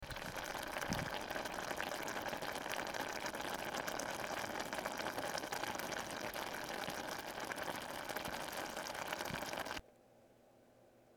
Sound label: boiling lentils